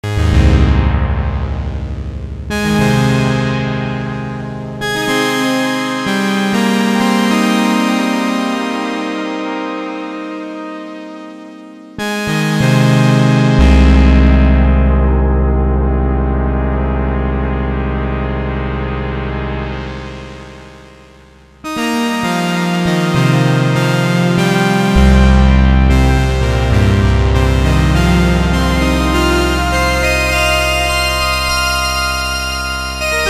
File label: JX10 big